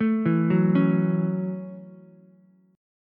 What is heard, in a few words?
A 6th, Ukulele; arpeggiated